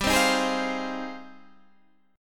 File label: G sharp Major 13th